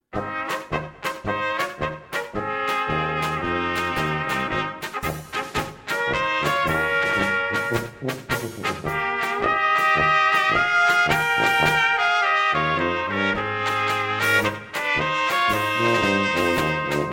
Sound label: Polka